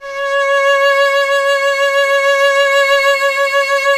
STR VC.C#, Roland LA Composer strings; Roland - LA Composer vol. 1

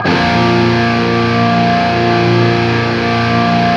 open A#, guitar; East West; Steve Stevens Guitar Samples Collection

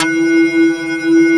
Prophet Five; E-MU Formula 4000 Series Vol. 3 – Analog Odyssey

